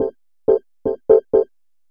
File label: HOUSE EXTRA, 125